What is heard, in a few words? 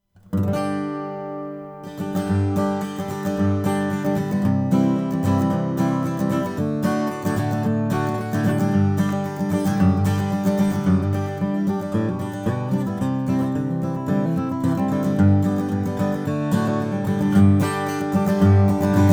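martin d 28 indian rosewood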